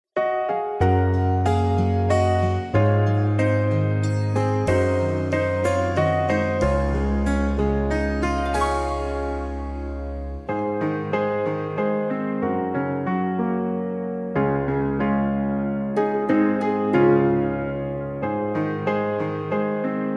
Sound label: Db No Backing Vocals